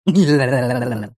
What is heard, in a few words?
cartoon laugh male med high pitched crazy bonkers